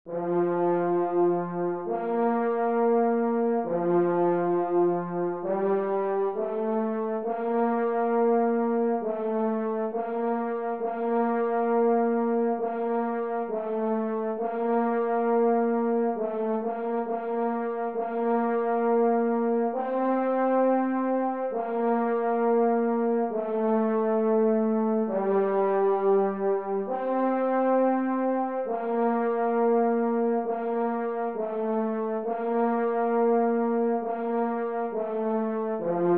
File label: F Horn Part